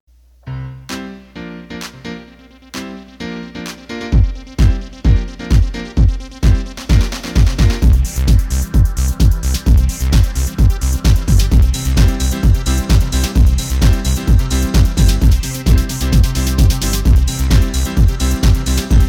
House

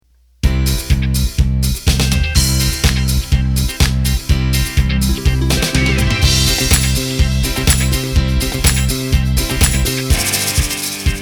Disco; roland bk7m

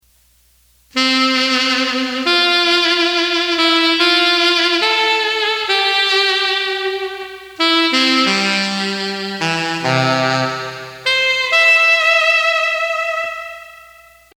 ketron Silly Sax

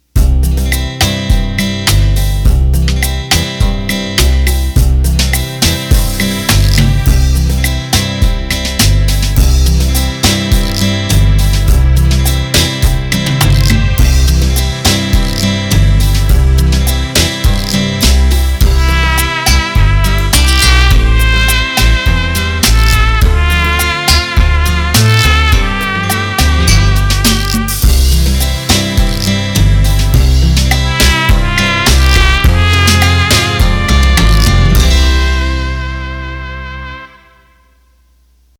Rumba, Roland accordion; roland bk7m

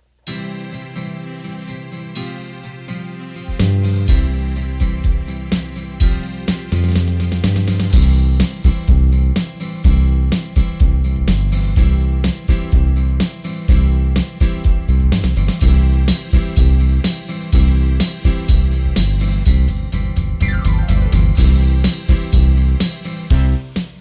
Old Fashion Rock

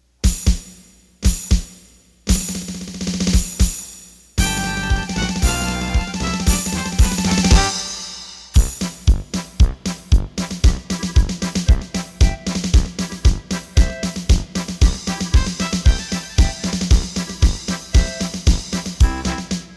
March 4 4

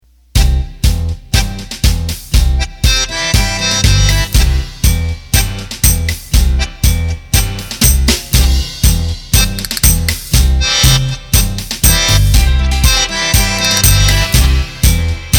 Tango; roland bk7m

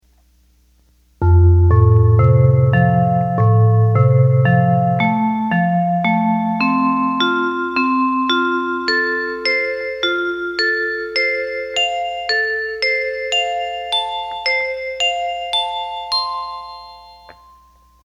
Vibes, accordion

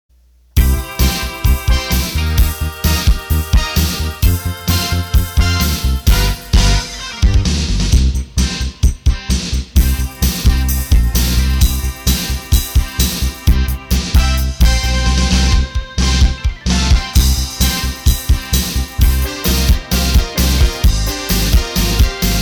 Rock

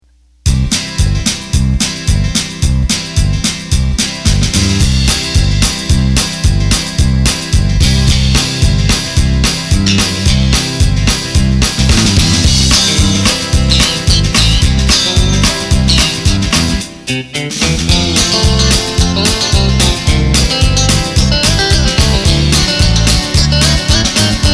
Fast Country; roland bk7m

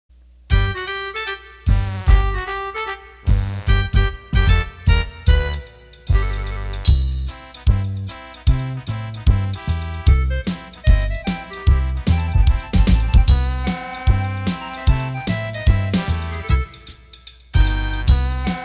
Dixie, accordion

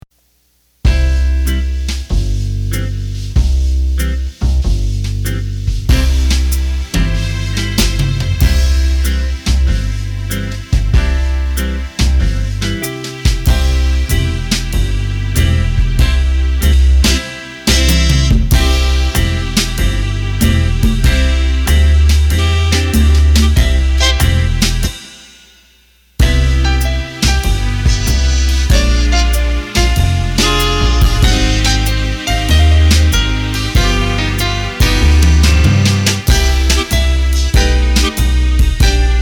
Ballad

